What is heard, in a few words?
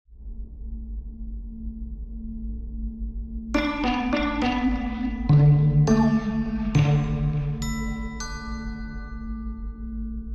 Sonata Bossa Nova